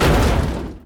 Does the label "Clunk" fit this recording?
Yes